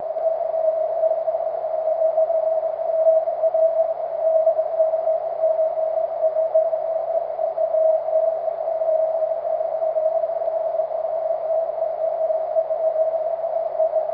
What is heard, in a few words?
cw, 23cm